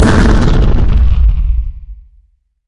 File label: bomber